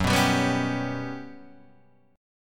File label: G Major Sharp 11th F sharp, chord